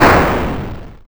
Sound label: explosion